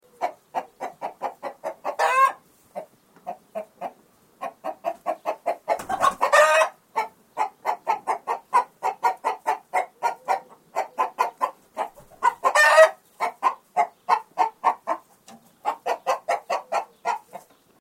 angry chicken

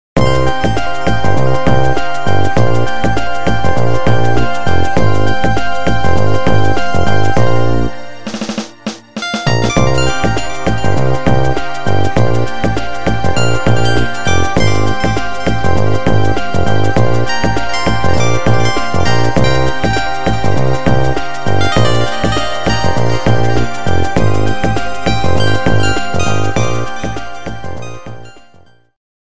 samsung 808

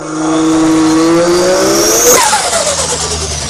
turbo sound effect

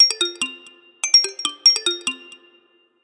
free marimba

